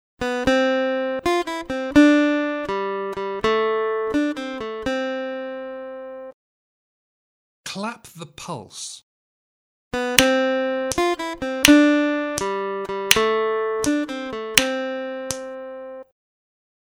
Aural Rhythm Test, acoustic guitar; Grade 3

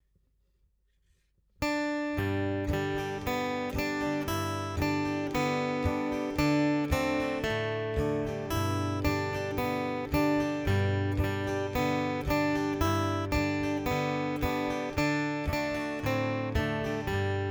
acoustic guitar; Step 1; Buffalo Gals

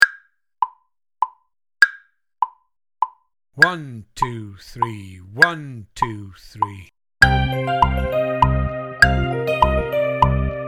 Ripples Click, drum kit

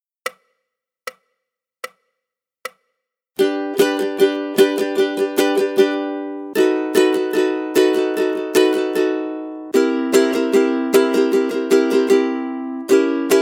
rhythm study, ukulele; grade3

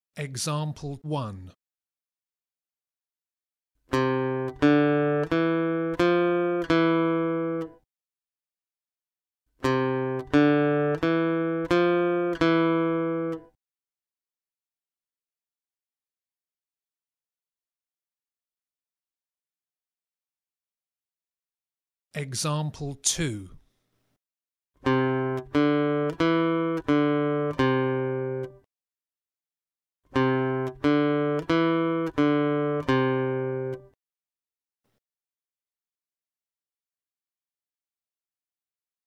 Aural Pitch Test, acoustic guitar; Grade 1

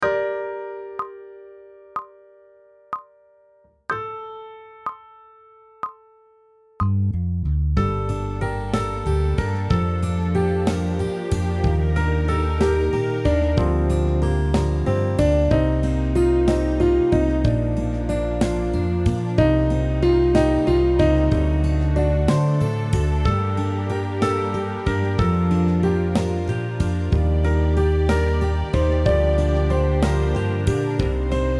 aural tests harm of a melodic phrase; Grade 8; pop vocals

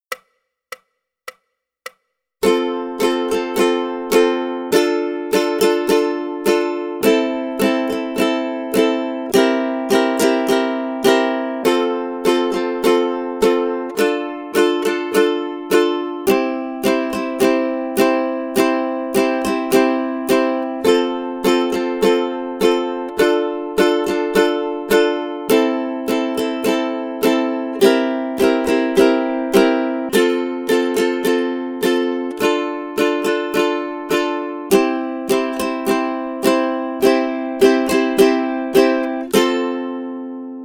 Rhythm Study, ukulele

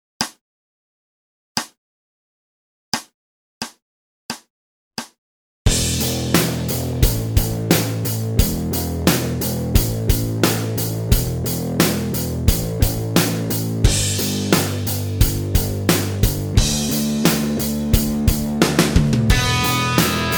Rock Jump The Barrier Backing; rock guitar; RGT

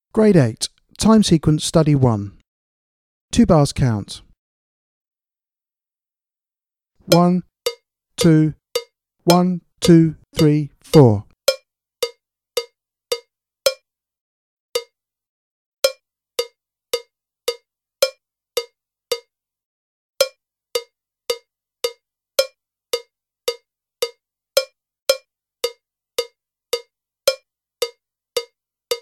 Click Track for Time Sequence Study, drum kit

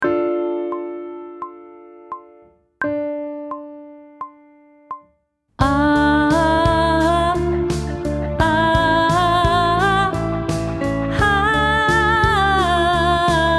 Sight Singing with vocal, pop vocals; Grade 3

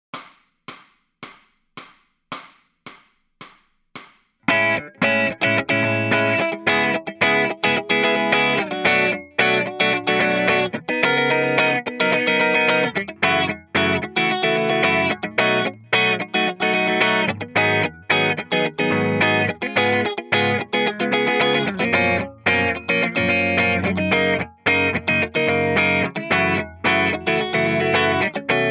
Lead Backing Track, electric guitar

